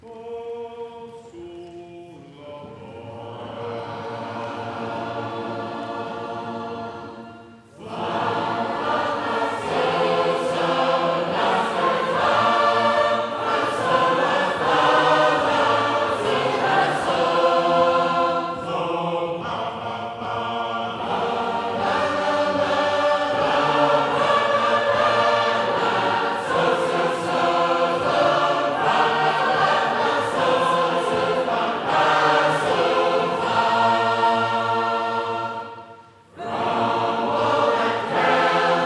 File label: Sacred Harp, Boston Singing; London Sacred Harp; 276 Bridgewater